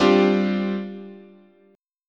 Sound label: G 7th Suspended 4th, chord